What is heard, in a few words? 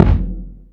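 bass drum, left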